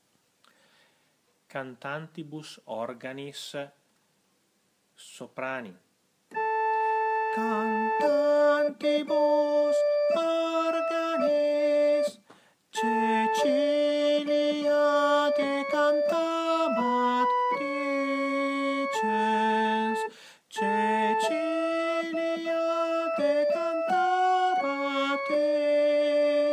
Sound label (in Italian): Soprani